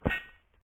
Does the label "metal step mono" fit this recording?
Yes